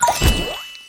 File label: pot; EggHeads